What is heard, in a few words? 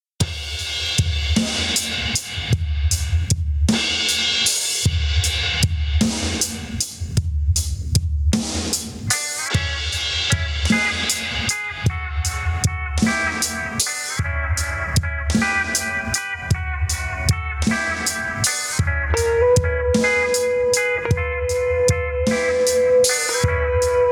black dog blues